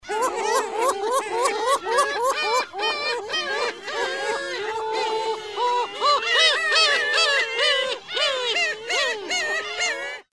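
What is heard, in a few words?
black-tailed gull song, birds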